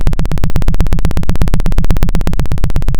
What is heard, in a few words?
Sound of a helicopter